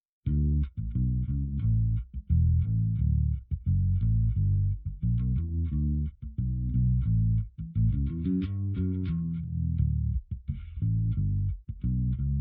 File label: Bass